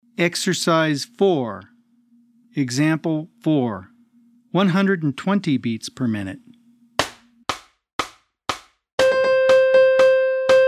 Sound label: 120 BPM